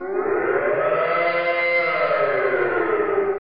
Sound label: alert in